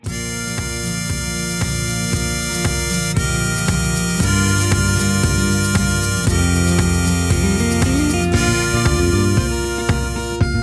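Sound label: Instrumental with leads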